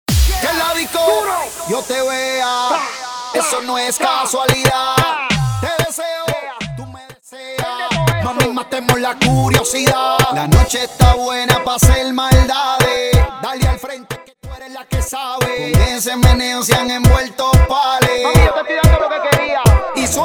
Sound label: Open Show 92 bpm